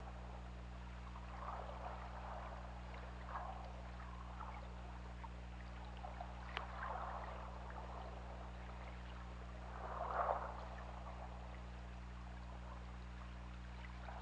Orca; OrcaLab

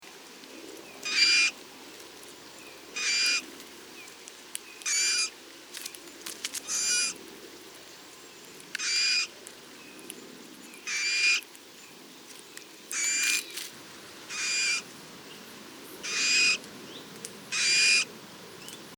Pyrrhula pyrrhula; SETE

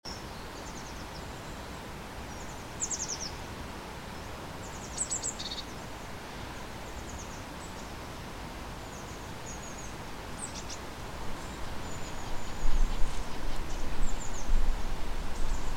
Cyanistes caeruleus; SETE

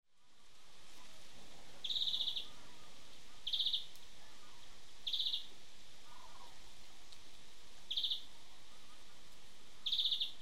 Chloris chloris; SETE

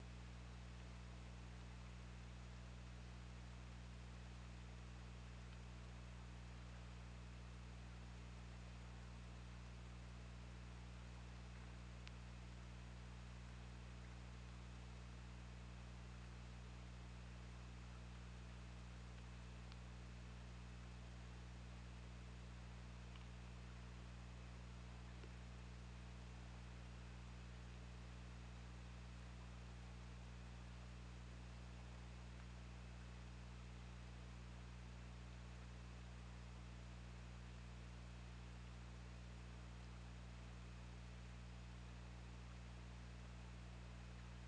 Orca; OrcaLab

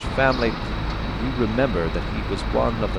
noisy

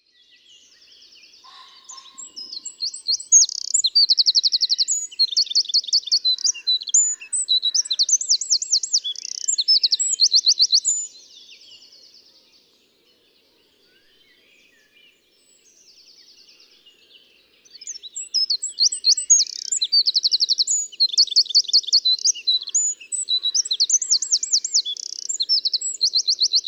Troglodytes troglodytes; SETE

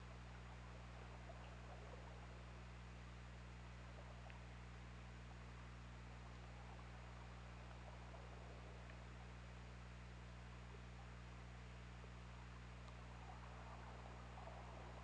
Orca; OrcaLab

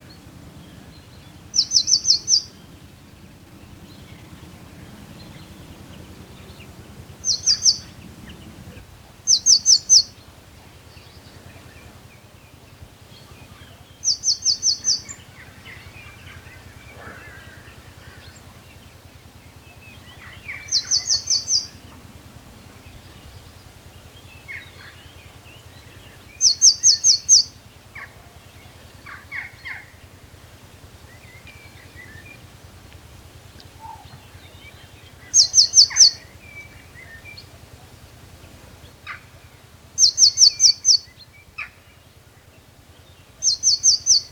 Cyanistes caeruleus; SETE

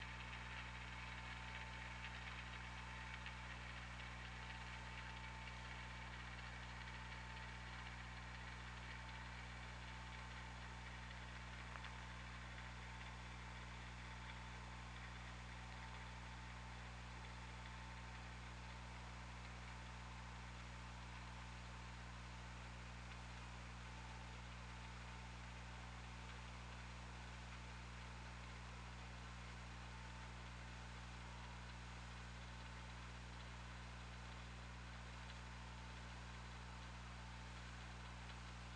Orca; OrcaLab

